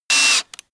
screwdriver free short; maschinen; schrauben drehen hydraulik

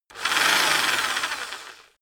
rock drill rev low medium

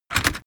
metal buckle handling

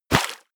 step walk water; schritte

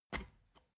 step ladder wood single; schritte

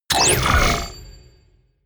sci-fi collect coins light, hits impacts

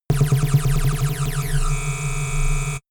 shot ringing wobble charge; pulsieren

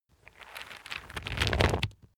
nut crunch; haus garten; kueche

